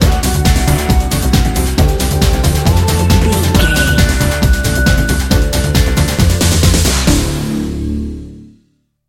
workout pump machine bumper, Dance, EDM, Club, Exercise, Aerobic, Aerobics, Spinning, Workout, Disco, Eurodance, Confident, Energetic